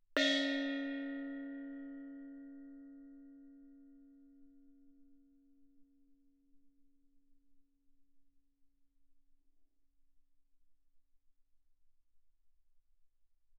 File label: thai gong Db4 ff; thaigongs